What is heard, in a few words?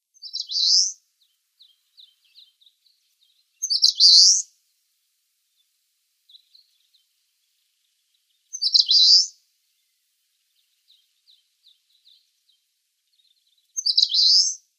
Eastern Crowned Warbler song